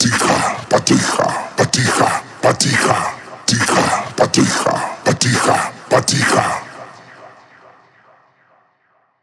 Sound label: 138 Shamanic Voice (Wet), Psytrance